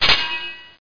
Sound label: AXE